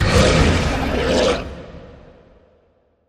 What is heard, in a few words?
two stacked land; halloweenjack